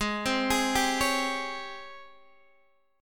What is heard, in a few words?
G sharp Major 11th, Guitar, DADGAD